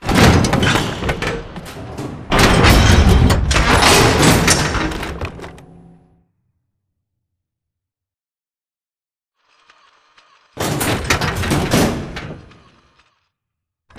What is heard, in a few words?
dog whistle pulse fail mech close; boneyard; Titanfall 2